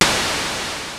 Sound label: RY-30 PERCUS; Rubber Chicken Software Sample Bank